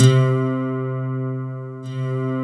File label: C3, SynthLine; 90 s Sample CDs; NiceBeats; SynthLine VOL-33